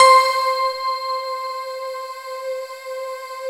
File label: JV-1080 BELLS PNO; Syntec; Wall of Sounds